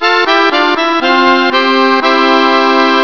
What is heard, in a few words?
Concertina; psion cd vol1